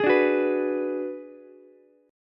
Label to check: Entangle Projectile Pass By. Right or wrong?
Wrong